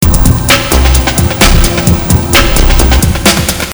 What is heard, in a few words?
Loop